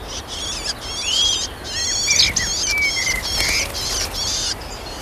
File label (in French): pie grieche